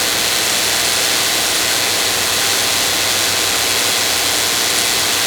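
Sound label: electric fence hum close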